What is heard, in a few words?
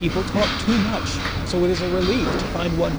noisy